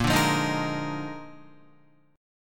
A sharp 11th, Guitar; strummed; JGuitar

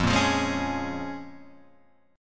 A sharp Minor 7th

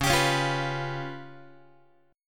Db 7th Flat 5th Guitar, Db7b5 chord; Db7b5 strummed; JGuitar

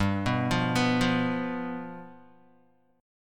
G 7th G, Guitar; G7 arpeggiated

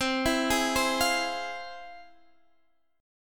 F Minor Major 7th, chord

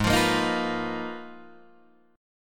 Ab Minor 6th Add 9th, Ab, Guitar, Open D

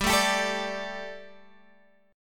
G Suspended 2nd G, down, Guitar, Open G

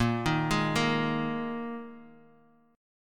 A sharp 7th Suspended 4th, Guitar, Standard; arpeggiated; 6,6,6,3,x,x; capo=2

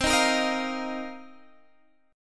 C Minor 11th C Guitar Drop D

